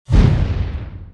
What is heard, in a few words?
Gargoyle death alternate